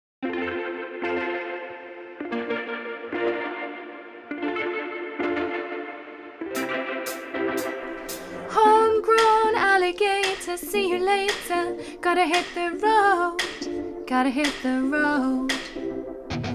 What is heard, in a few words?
Shotgun alto